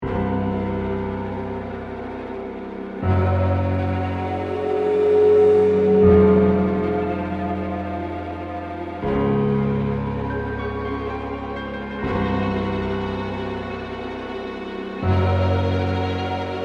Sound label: Composition Drama, Horror, Musical, Composition, Dramatic, Haunted, House, Creepy; Scary Soundscape Sound Effects; BlastWave